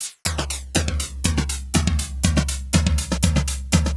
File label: 121 Hats, Drum Loops; Back2Basics; 121bpm; Nu Dance Electro